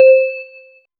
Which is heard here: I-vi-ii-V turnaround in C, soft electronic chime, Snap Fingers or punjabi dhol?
soft electronic chime